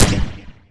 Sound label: smg fire; weapons